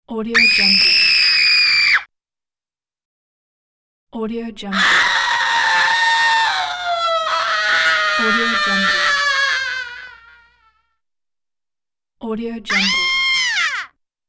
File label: girl screams